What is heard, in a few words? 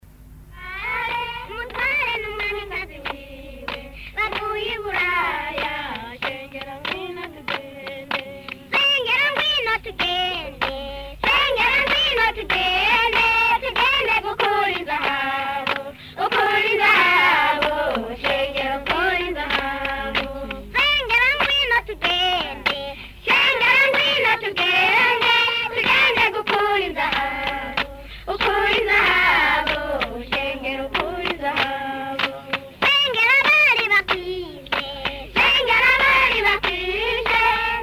uruvyino meisjes, burundi